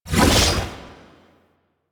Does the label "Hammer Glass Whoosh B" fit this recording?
Yes